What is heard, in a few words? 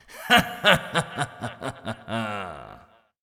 Bad Guy Male Laughter